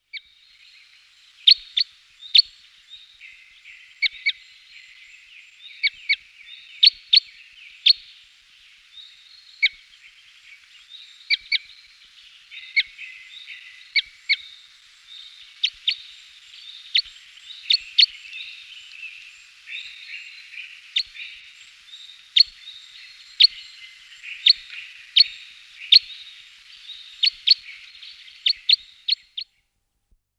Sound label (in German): fichtenkreuzschnabel, gesang; singvoegel; roche 2004